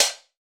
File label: Hi Hat Open